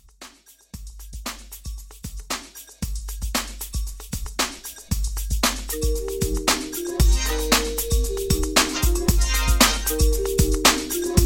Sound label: 80s Dance Pop